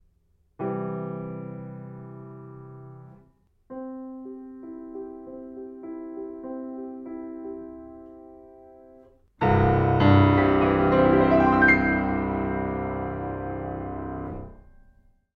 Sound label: cmaj chord